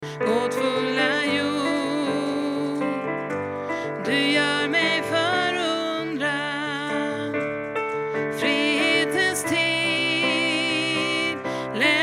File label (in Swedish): sträck ut din hand alt, stammor